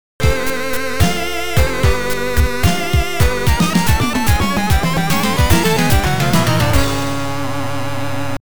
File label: Game Over; Sonic and Knuckles; Sonic 3